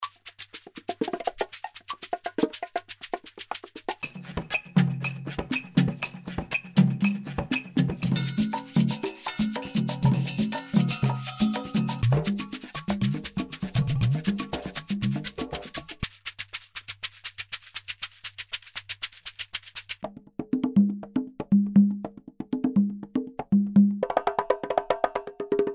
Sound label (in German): Klangbeispiel BPM 120